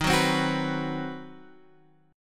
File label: C Minor Major 7th Flat 5th D sharp, chord